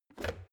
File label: plastic water boiler lid off